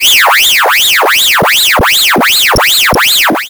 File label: alarm